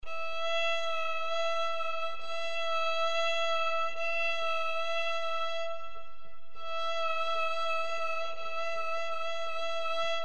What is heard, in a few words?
vibrato, violon